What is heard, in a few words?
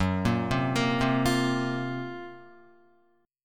F sharp Major 7th Flat 5th, Guitar; arpeggiated; JGuitar